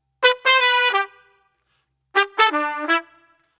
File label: Funk, brass